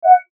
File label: Mouseover Single Tone Buzz Ascend; Multimedia; Blastwave